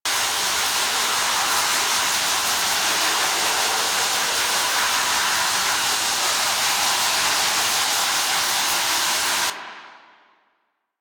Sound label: Infested Mist Loop A, Ambience; Warframe; Infested Eris; Encounters